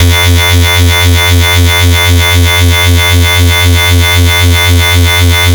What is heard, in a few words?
FS (F2), Synth; neuro; Stanza; FightSynth Multi